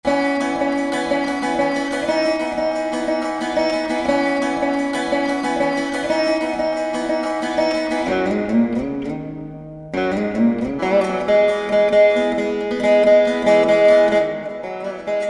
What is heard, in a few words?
Indian Veena Guitar; Spectrasonics Heart Of Asia